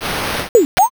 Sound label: gacha roll